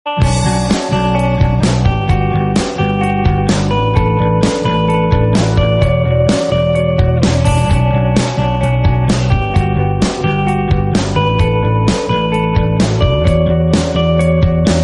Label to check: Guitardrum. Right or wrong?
Right